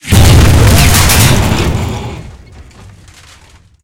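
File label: tank hit high-explosive big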